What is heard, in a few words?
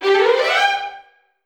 082 R&B String Run G; R&B Funk Soul